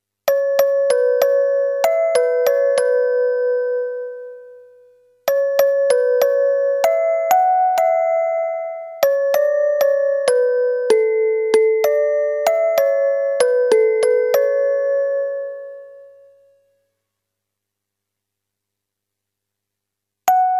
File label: Sun orgel; Sacred Plume